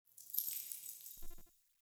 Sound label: Idle Head Shake; Tagfer; Warframe; Ambience; Entrati